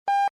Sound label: beep